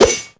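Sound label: weapon miss; tm ventrilo; slender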